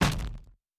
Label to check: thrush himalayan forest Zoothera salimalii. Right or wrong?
Wrong